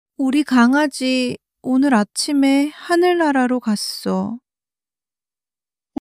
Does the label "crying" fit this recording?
Yes